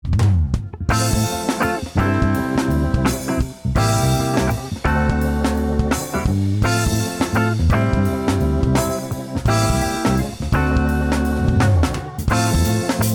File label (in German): Lieder Instrumental; ABC der Tiere 1; Kontrastpaare